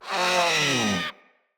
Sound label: Kavat Vocal Death; Warframes; Khora